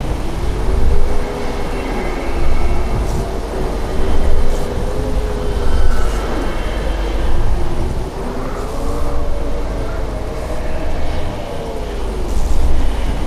push back, ambience

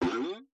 Boing; creatures organic

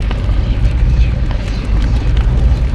Maelstrom Fire

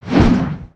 Long Whoosh; Valiant; aronwk collection; ndaudio

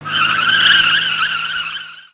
tire Screech

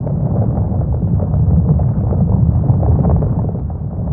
Asteroid loop

